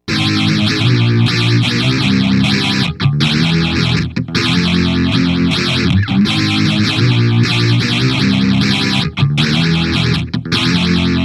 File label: grungy gtr tc xii re